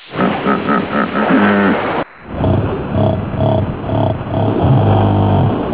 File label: hippo